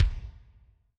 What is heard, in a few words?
Lo Room K, Alesis SR16